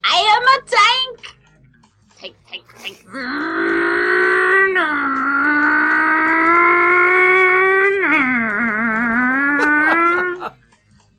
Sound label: tank